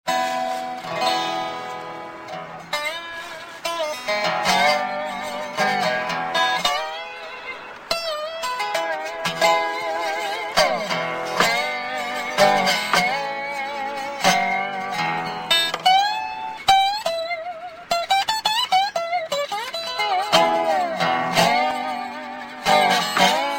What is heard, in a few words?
Dobro